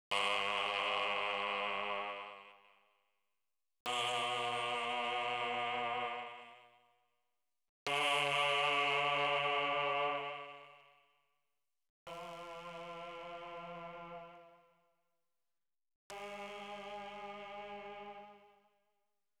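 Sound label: Mellotron Male Ahh G#+3; Choir & Voice; Klaus Schulze Collection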